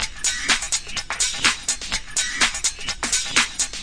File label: 125 bpm shimmering garage space, drum loops and breaks